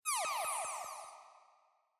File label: shoot